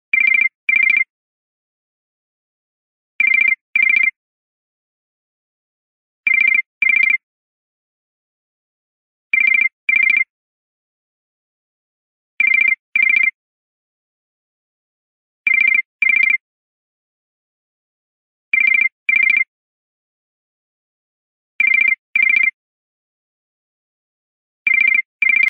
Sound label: nokia ringtone